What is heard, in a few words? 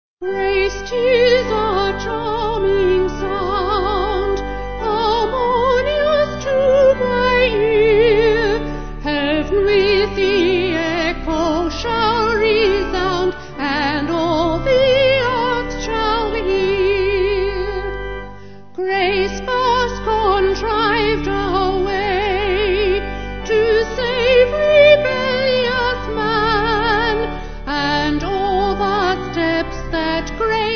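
Vocal Organ, hymn; Grace Tis A Charm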